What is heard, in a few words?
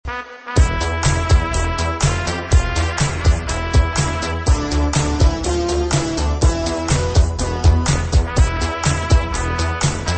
ringtone